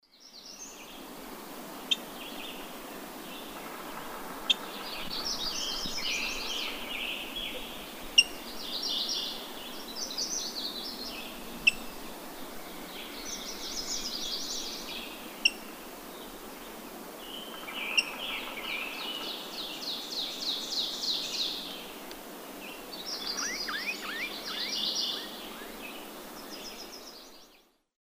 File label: Pheucticus ludovicianus, call and subsong female; S. America; Eastern Lowlands